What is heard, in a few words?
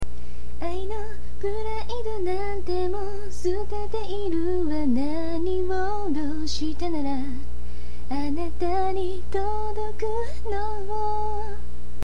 song